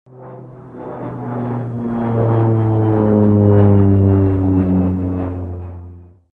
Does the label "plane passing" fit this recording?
Yes